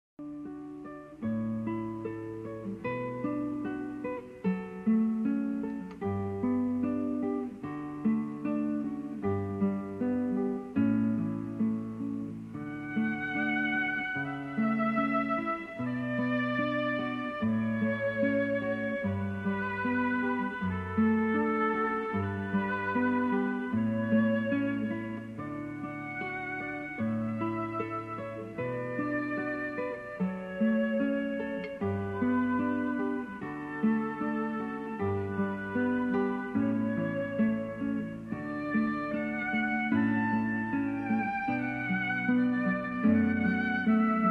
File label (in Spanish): guitarra y violin